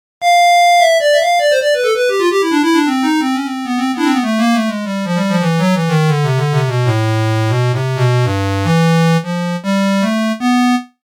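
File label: Clarinet, electronic keyboard; Native Instruments Kontakt 3.5; dry instruments; MIDI file of a Bach piece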